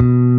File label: bass